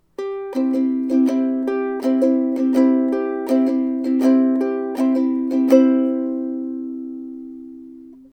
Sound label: ukulele